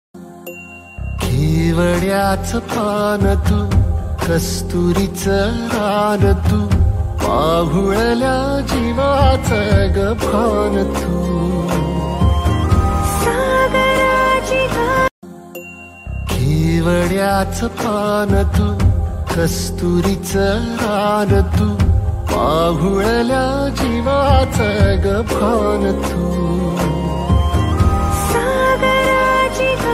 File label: marathi new ringtone song new trending ringtone